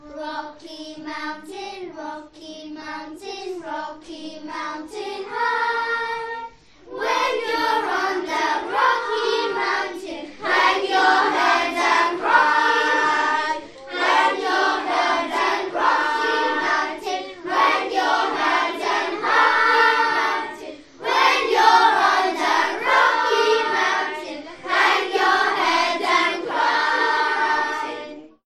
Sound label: Rocky Mountain in four parts